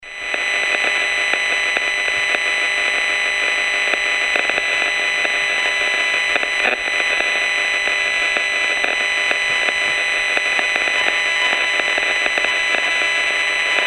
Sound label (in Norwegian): LTE 2600 4G; 4G LTE 2600 Basestasjon